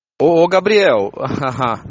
hahaha

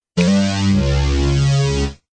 whammy fogghorn; remaster

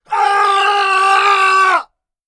man scream

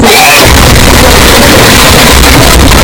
ear rape sneeze

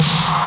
fat snare

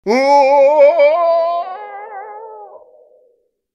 falling man scream sound effects

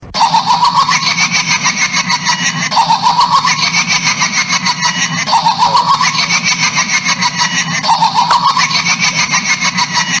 scary laugh; Scarylaugh1 Meme

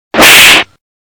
loud slap sound

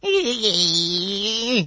eeeeeeuh; luigi

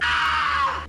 funny scream

